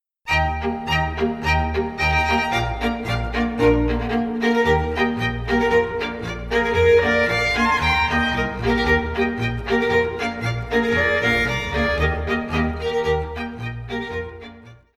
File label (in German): Polka